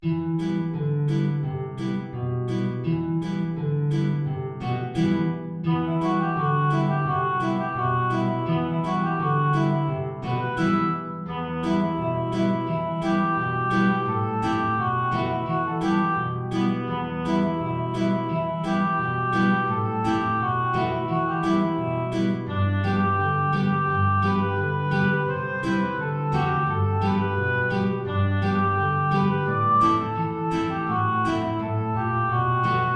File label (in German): Oboe Gitarre tief; Chiribim Chiribom